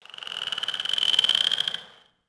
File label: frog; Tony Hawk's Project 8; XBOX; houses